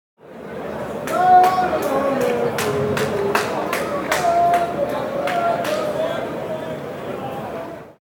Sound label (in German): Stadion Fans und Gesaenge